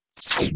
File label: Sci fi whoosh